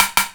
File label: SN, Drum Machines; 300 Drum Machines; E-MU Orbit-3